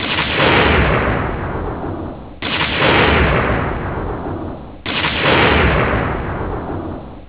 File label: thunder